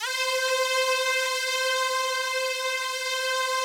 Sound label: SPACE STRINGS C5, Yamaha VP-1; Music Store